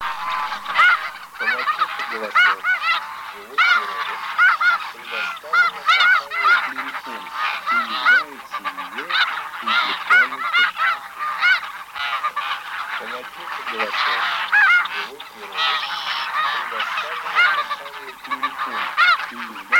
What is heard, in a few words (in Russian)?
Гусь белый Oca Delle Nevi; plurifon